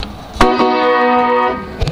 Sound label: chord